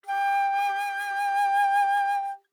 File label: Alto Flute vib ff G5 stereo; Woodwinds